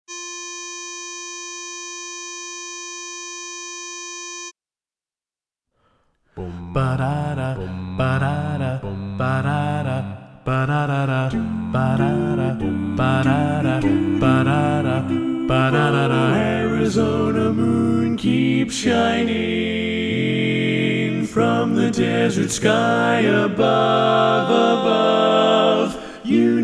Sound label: Baritone Left